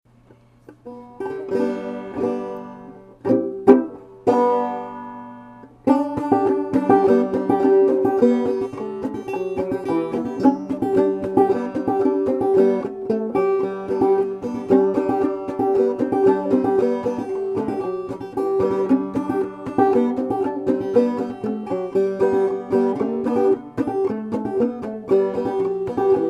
banjo

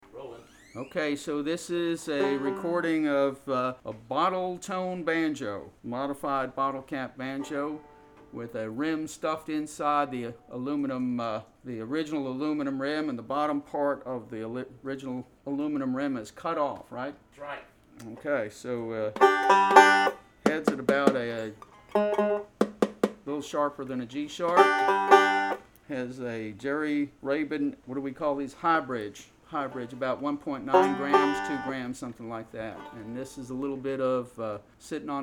bottletone, banjo

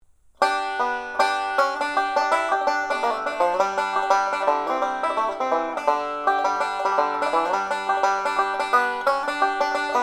banjo

